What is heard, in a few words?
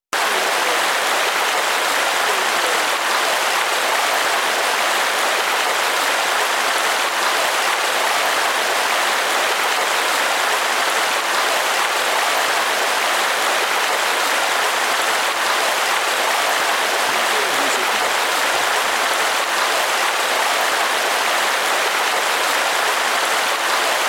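Mountain River High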